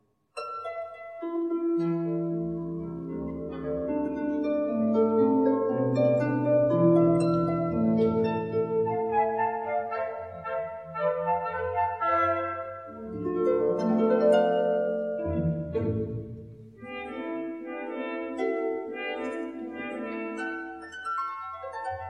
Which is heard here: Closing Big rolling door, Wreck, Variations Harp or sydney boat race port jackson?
Variations Harp